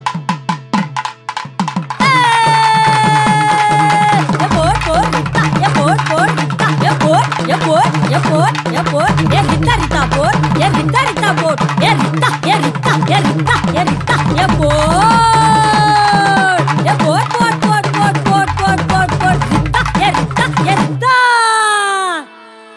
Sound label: Humming Ringtone; Karnan